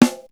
Snare, Drum One Shots; AMG - Coldcut's Kleptomania VOL-1

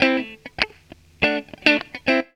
GTR, GTR RIFFS, Funky Elements; Zero-G

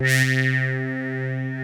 SEM LEAD C3, Analog; USB Soundscan vol.49; Analog Fantasies; AKAI; PROPH

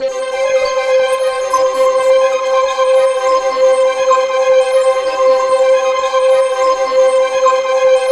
complex C5, ethereal atmospheres; Soundscan - Ethereal Atmospheres

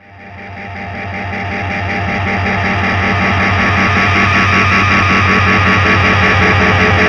Feedback, Industrial; Nasty

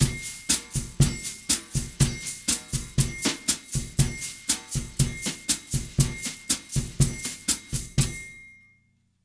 120 brush, brazil bossa; USB Soundscan; AKAI

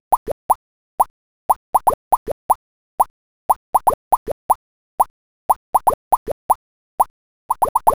Bubble Beat, Electronic Beats; Sonic Foundry (Sony Creative Software); Myths of Technology

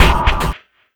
Oneshot

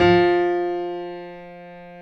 PF BDF F 1, Piano; AKAI S6000 CD-ROM - Volume 1; STEREO G-PF 2

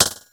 TYPE, FM PERCS; Crypton - DrumBase5000

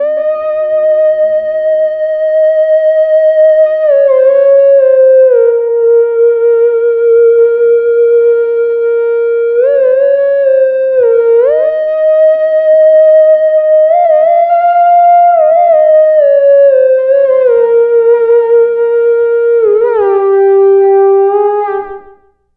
Lap Steel, Trip-Hop Loops; Sonic Foundry (Sony Creative Software); Rondo Brothers Trip-Hop Loops & Samples; Hard Rocks The Roof

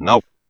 Vox, Yamaha DD-20

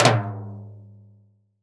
Timbal Lo Flm, Roland BK-5; Percussion

